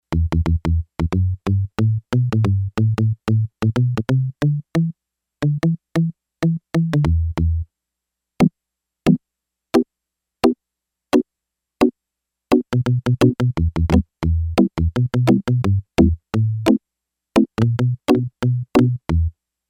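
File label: Mute Guitar, Yamaha DX11; Factory Preset Voices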